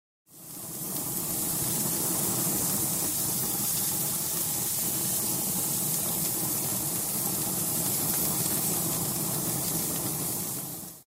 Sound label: barbque sizzle